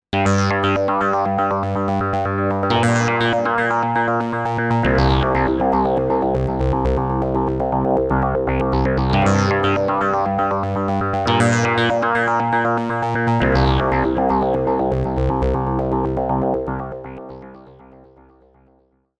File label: Buchla; Fireball